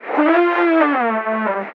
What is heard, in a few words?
vocal skew Gm; SD AURORA Sample Pack; Slate